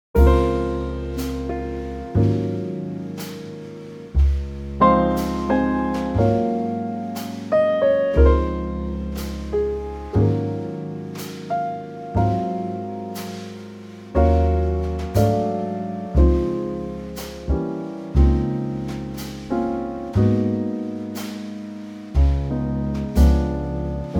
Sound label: Trio; key - Db - vocal range - (optional F lowest note) Ab to Eb; Gorgeous Trio arrangement